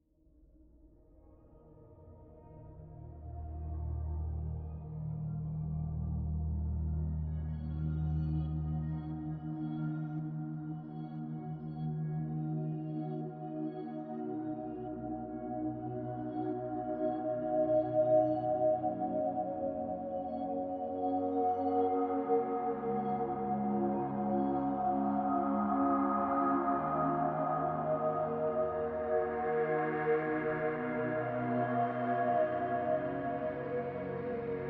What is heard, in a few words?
Lullaby (Ambient Remix)